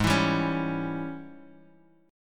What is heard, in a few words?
Ab Major 7th Suspended 4th Sharp 5th Ab Guitar Open D; 6,7,5,7,x,x